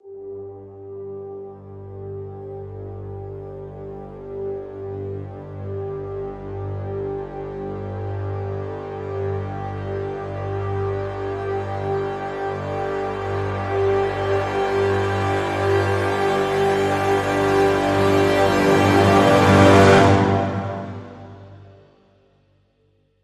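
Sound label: Crescendo Brass Swell Full (G)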